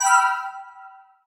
Pickup Gem Bells; SFX Kit; Pickups

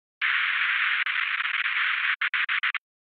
Radio Static Sci-Fi Cut Off Radio Static; Hollywood Edge; sound designer's toolkit v2

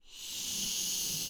air horn with a balloon blow; noisemakers; NS Studios - ultra sound collection

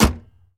Chrysler 300M Hood Close, Automobiles

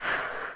agent thrown; Apotheorasis

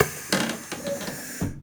bus window; best service Blue Box

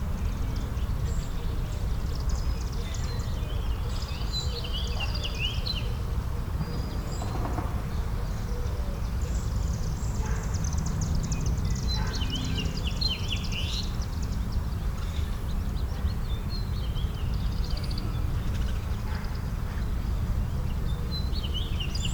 Suburban day birds mower traffic; boom library

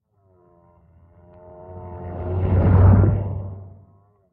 sea animals; Blind Drive

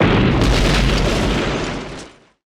Explo Underwater; Destruction

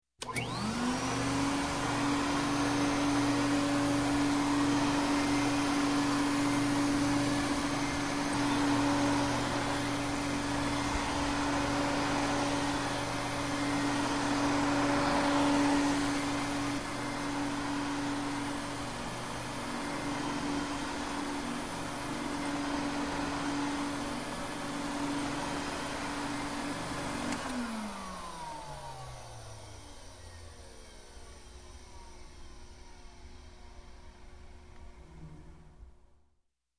Vacuum Cleaner Start, Run, Stop; Sound Ideas 2000 Series

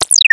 Arcade Action, Video Game Sounds; 1001 Sound Effects

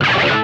Pickup Guitar Scrape; Pickups

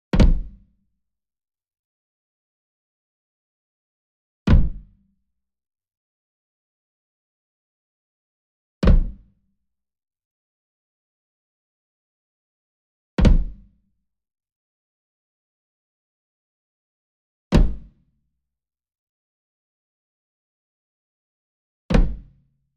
SMALL DRUM Tabla Flam Hard Beater Near; boom library; Cinematic Strikes Construction Kit

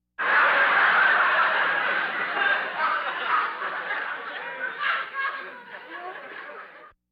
Laugh tracks

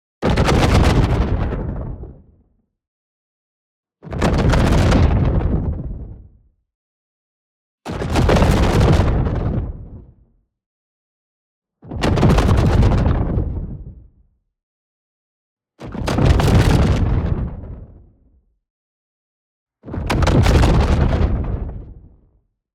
EXPLOSION LARGE Thunderous Tail; boom library; Matter

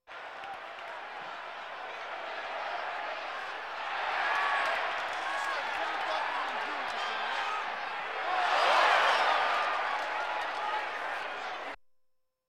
Sports crowds

